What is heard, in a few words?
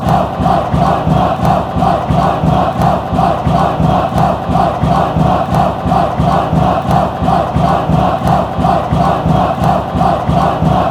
off, chants